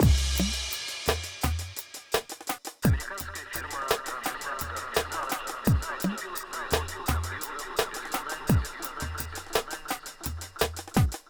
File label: Drums FX Combo, 085 Amin